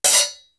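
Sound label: house hat, cymbal; East West; Global Sounds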